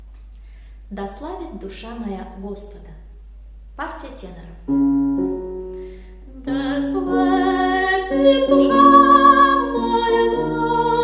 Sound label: tenor; epiphany; da slavit dusha moya